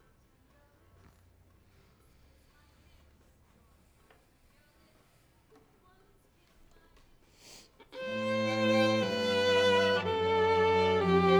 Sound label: violin